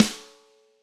long room reverb snare drum g sharp key

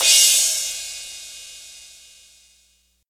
crash cymbal sample a key; cymbal drum samples

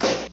90s clean hip hop acoustic snare sound c key

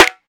natural snare drum sample g key

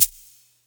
shaker a key; Duration 0:01; Mono; percussion

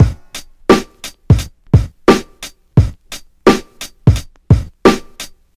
87 bpm 90s rap drum loop sample g sharp key

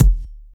silky bass drum e key, Loudest frequency: 589Hz; kick drum samples

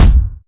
mid range kick drum sample A key; kick drum samples

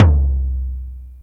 large room tome drum sample c key

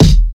bright kick drum single hit E key; kick drum samples

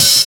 dry open hat sample e key

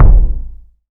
large room kick drum sound f key

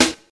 raw snare one shot D# key; snare drum samples; one shots

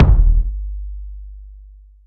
big room kick g key

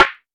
rich lows snare drum sound f key; snare drum samples

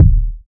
urban kick drum d key

